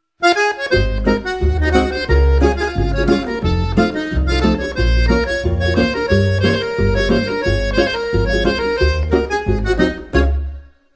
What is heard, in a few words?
fado portugues